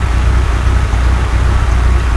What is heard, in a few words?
Truck Idle 8 Bit; abbottabad compound